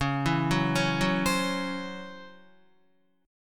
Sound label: C sharp Minor Major 7th Double Flat 5th Guitar; C#mM7bb5 arpeggiated